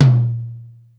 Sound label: Low Tom, Yamaha DD-35